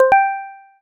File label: till